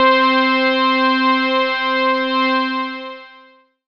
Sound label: pad, Dance Synths